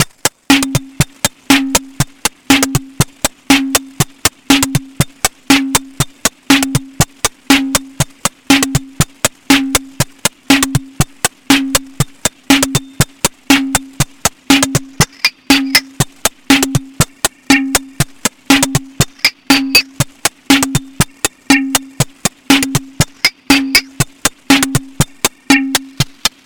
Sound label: Moogerfooger Drums